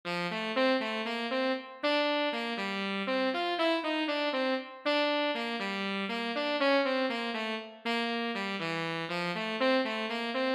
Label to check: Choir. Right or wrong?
Wrong